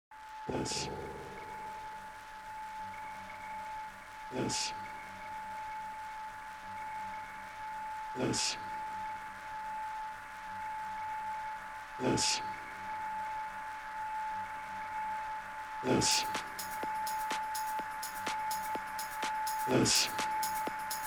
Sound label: dark techno